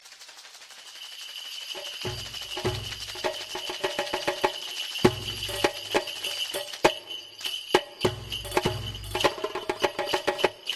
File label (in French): natural musique tambour chamanique